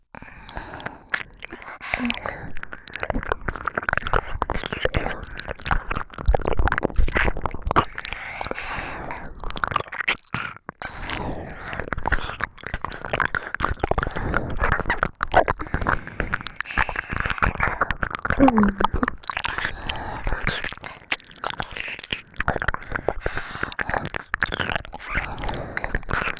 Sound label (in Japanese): 耳舐めループ